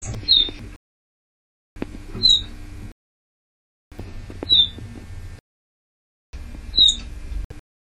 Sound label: call contact, lavender waxbill; Call 1 - contact call; Lavender Waxbill finches